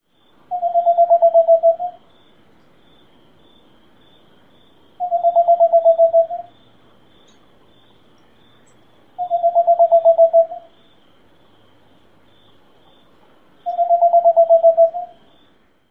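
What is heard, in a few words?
Whiskered Screech-Owl tooting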